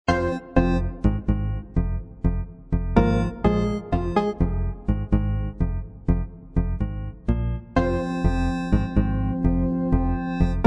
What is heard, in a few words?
animals organ